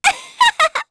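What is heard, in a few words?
Vox Laugh, Korean; Pansirone; kingsraid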